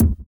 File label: 165 BK BD, Explosive Jungle; 165 BREAK; USB Soundscan vol.05; AKAI; 90s sample CD